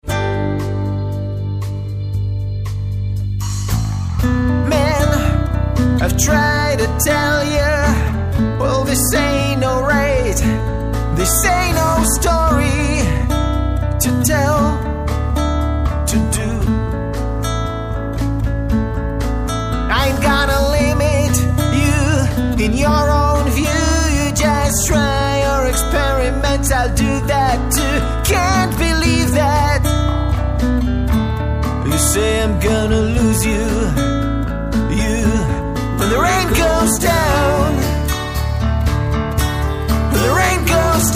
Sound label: Rain